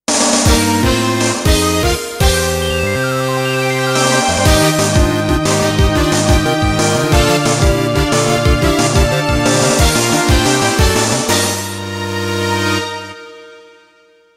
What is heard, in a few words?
jingle gorgeous!